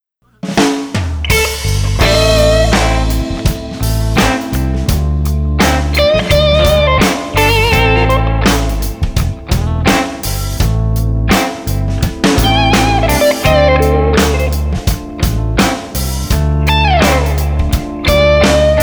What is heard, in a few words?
Blues Rock Smooth Groove 80